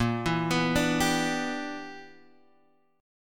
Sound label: E Minor 7th Bb; arpeggiated